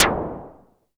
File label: perc, Novation Bass Station 2